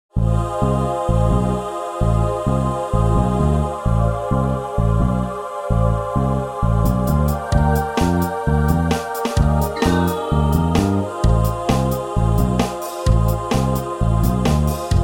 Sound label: MIDI ditty; spellman studio